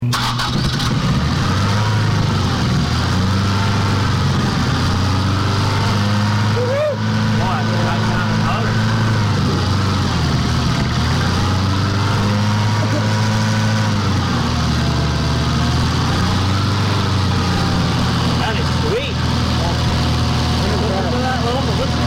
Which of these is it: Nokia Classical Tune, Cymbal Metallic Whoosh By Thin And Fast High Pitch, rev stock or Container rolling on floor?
rev stock